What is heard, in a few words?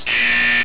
Buzzer; T-Clock; Alarm